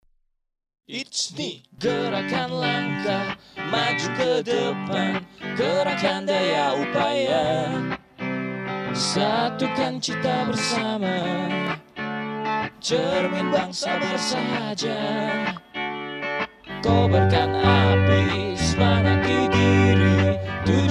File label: JINGLE; KPM; identitas organisasi